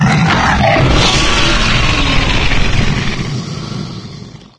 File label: antlion guard die; npc; Garry's Mod; hl2